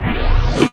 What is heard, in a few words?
FX, Drum & Bass, 150 BPM; Ueberschall; Drum & Bass Resonance